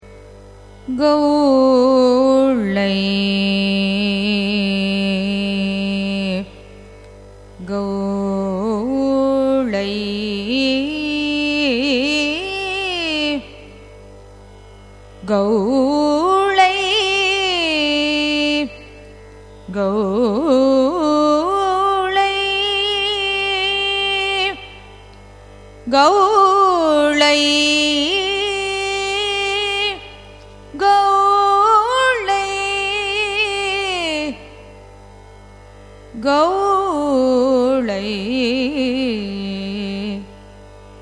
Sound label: raga gowlai signature; carnatic music